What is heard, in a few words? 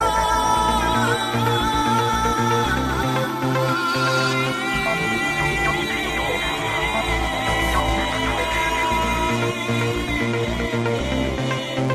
tajikistan pop music ringtone